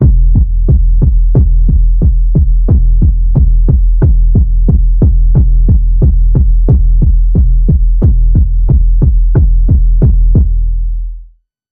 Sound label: Underwater Pulse Wave E